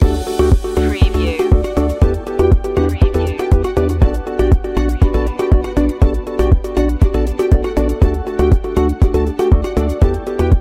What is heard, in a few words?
communication beacon loop